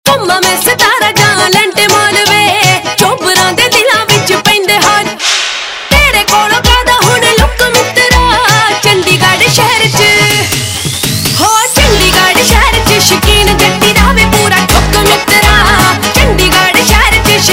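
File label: Ringtone, Punjabi Pop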